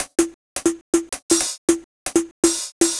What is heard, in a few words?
160 Perc, Drum Loops; Zero-G; Soundclash Nu Dance Electro; Acidized Wavs; 160bpm